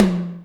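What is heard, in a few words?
Hi Dbl Rm, Alesis D4 drum machine tom; 300 Drum Machines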